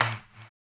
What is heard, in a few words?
HAMBONE, Vocal Blues Soul; Spectrasonics; Planet